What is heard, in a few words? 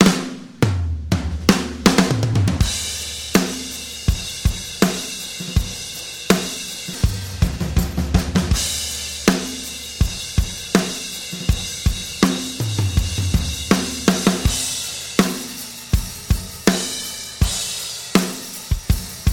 Live Drum Solo